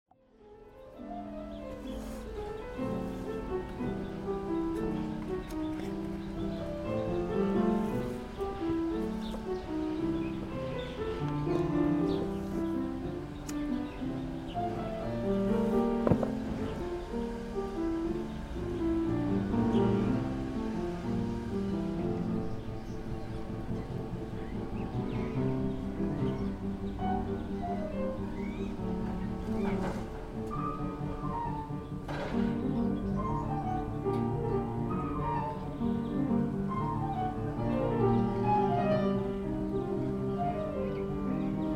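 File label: Field Recording Neve Tzedek; Identity Lab; Philip Reitsperger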